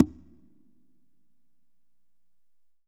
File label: Congas wet, drums percussion